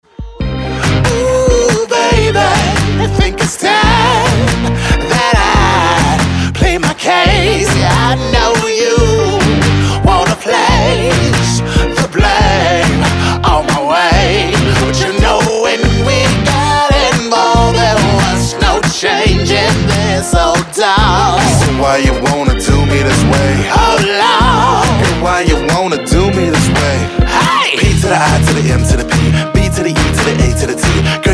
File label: Pimp Beat